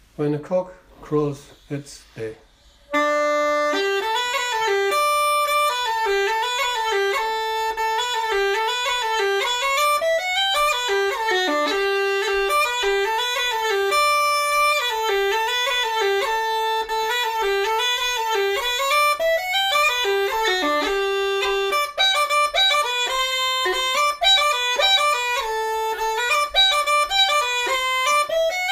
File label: chanter sample; daye; gerry muirhead